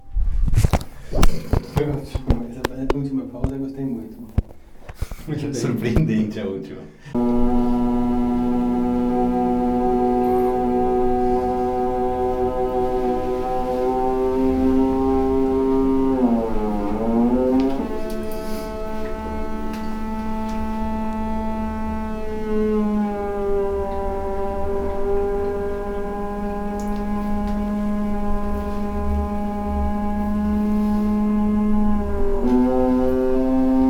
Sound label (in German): duschschlauch; Fieldrecordings; Brasilien; bertonis